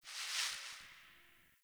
Garbage Plastic Bag Rustle; Warframe; Ambience; Nineteen Ninety Nine

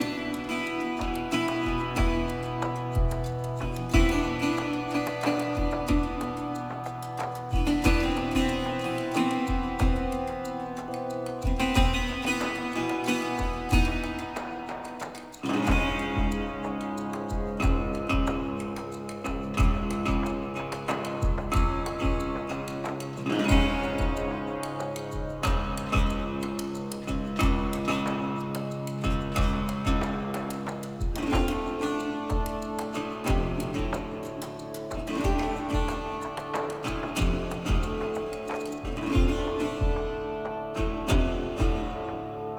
Menu Music Loop; UI; Archivist; Warframe; Duviri

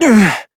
Pain, Adult Operator; New War

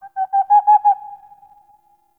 Forest Owl; Ambience; Warframe; Grineer

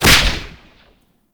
Whip Crack Small; melee; weapons; Oraxia

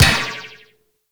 Ball Bounce Metal; Warframe; Tenno Ball; Missions

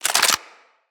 Clip Out, Weapons; Lex

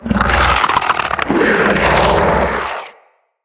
Spider Vocal Chatter; Vocals; Root Spider Mother

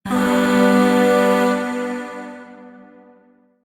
Shawzin Maj Chord H; Warframe; Zariman; Void Shawzin; Chords

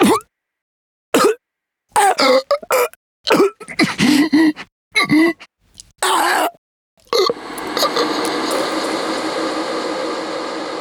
Choke Death; Cinematics; Finale; Ballas

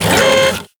Thumper Bot Servo; Warframe; Grineer; Movement

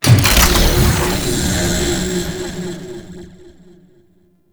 Shrine Assemble Activation; Warframe; Duviri Murmur

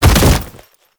Bodyfall Light; Warframe; Lasrian Soldiers; Foley

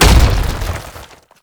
Tank Infested Step; Enemies; Movement; Lasrian Tank; Warframe

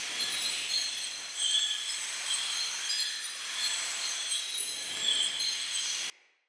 Energy Restore Lore Mote Loop

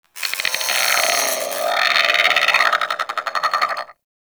Sentient Brachiolyst Idle; Warframe

